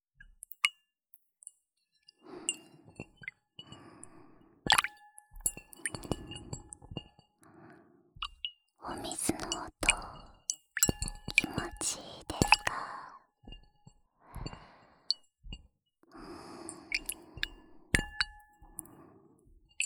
水聲療癒, ASMR

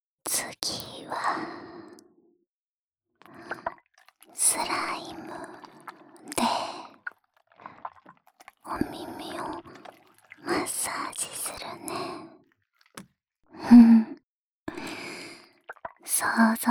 耳朵史萊姆按摩, ASMR

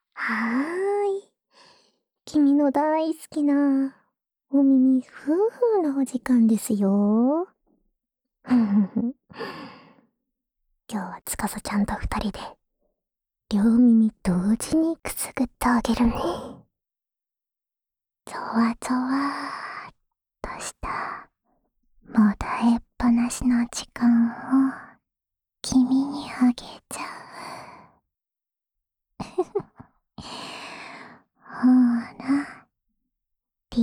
安眠用吹耳朵循环（位置反转）, ASMR; 安眠用吹耳朵循环（位置反转）

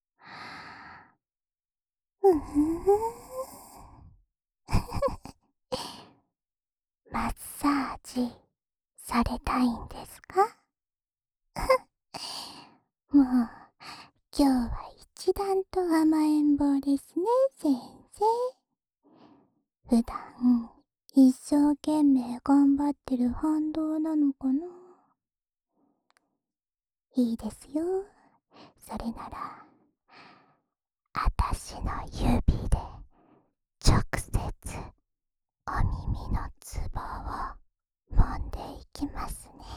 安眠用 按摩皮肤循环（左耳）

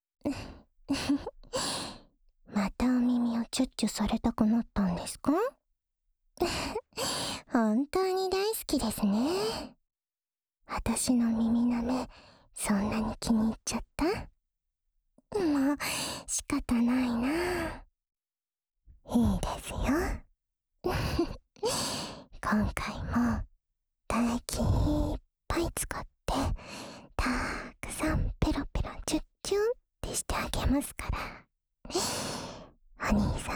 浅浅的舔耳（左耳）; 辅助自慰用

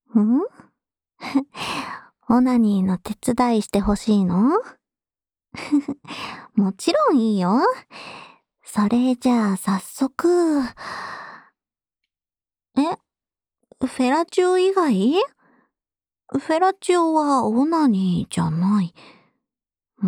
舔耳 左; 辅助自慰用

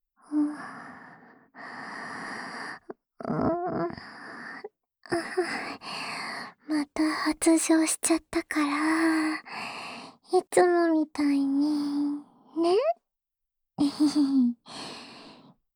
辅助自慰用稍带唾液的舔耳循环（右耳）, ASMR; 辅助自慰用稍带唾液的舔耳循环（右耳）

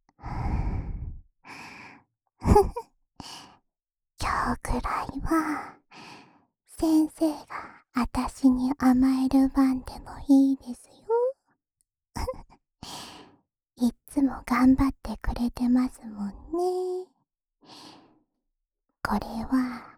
安眠用 掏耳循环（左耳）

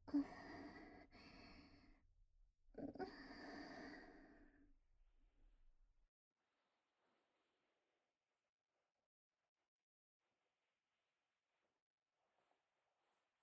被前辈舔耳朵

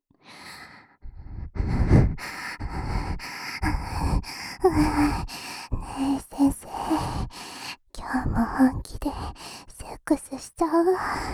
辅助自慰用 低声oho循环（右耳）

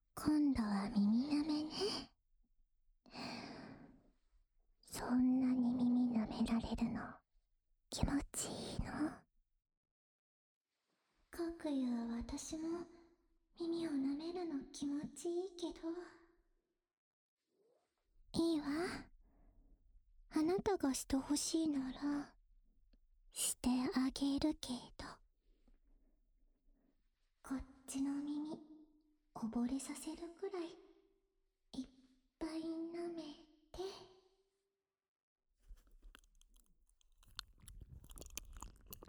舔耳循环（左）, ASMR

